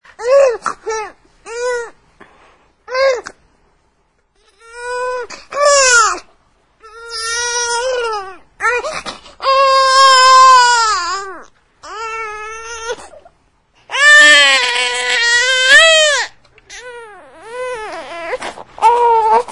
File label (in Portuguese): Bebe Chorando; Vinhetas